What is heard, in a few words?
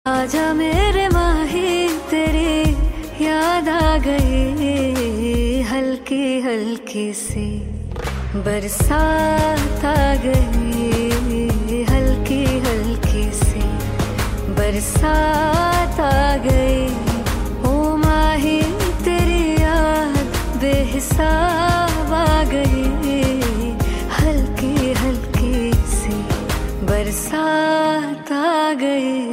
Hindi Romantic